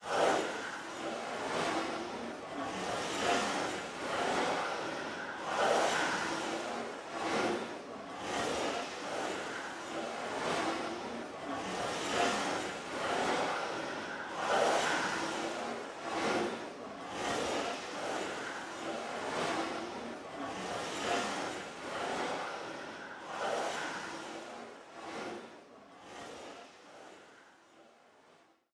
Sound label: Player Hand Slide Rail Loop Combined